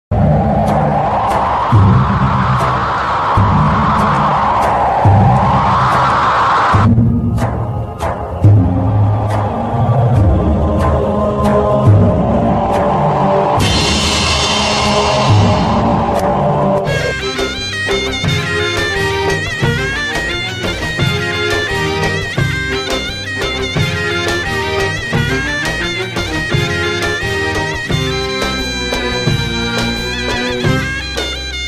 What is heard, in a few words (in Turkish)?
amasya halk oyunlari folklor; si-m-si-m